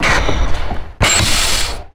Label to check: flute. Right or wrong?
Wrong